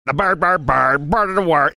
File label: singing mocking